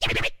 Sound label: pause, gui